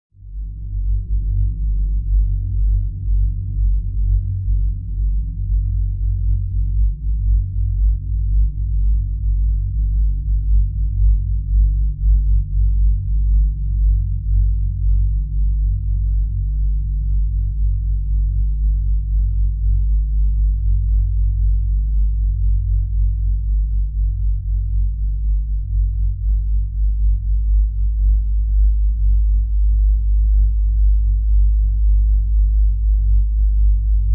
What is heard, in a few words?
Energy Field Pulsing, spaceship fx